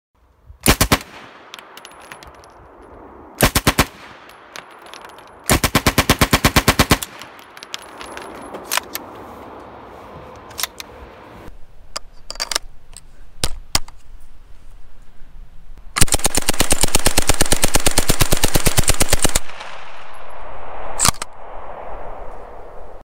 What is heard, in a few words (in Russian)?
vystrely mp40